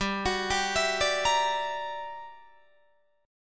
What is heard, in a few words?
G Minor Major 13th, G, Guitar, DADGAD; GmM13; arpeggiated; 17,21,17,21,17,20